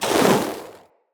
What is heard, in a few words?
Elemental Fire Arrow Shot, Bows; Weapons